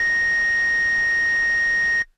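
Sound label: Air Bass Kawaii A#1; keys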